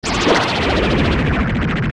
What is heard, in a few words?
Barinade Stun; zelda; OOT